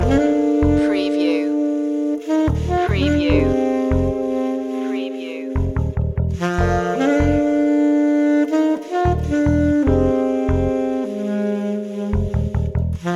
alto summer loop

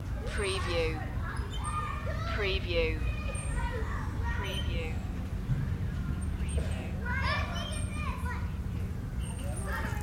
Playground Kids Playing Long

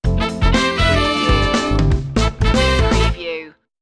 positive vibes stinger

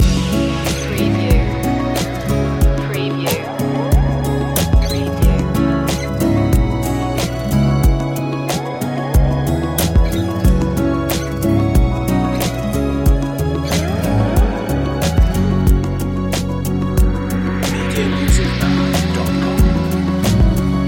chill acoustic flash loop

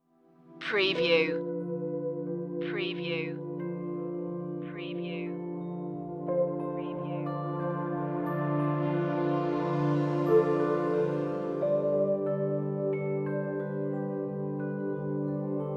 breath

